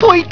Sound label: poink